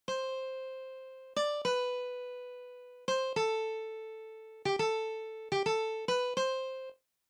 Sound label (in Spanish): Guitarra